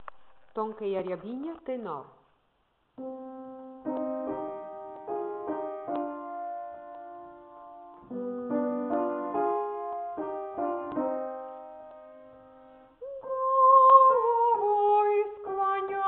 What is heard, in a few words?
tenor; tonkaia riabina